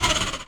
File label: Servo Down